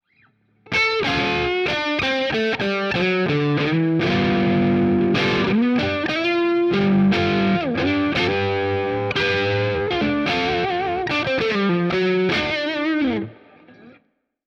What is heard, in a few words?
Cort SP90 Solo, guitar